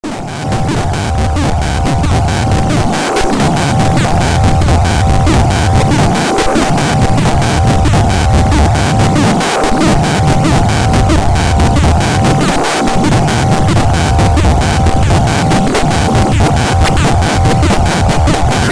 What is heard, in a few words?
HSS2c synced to HSS2c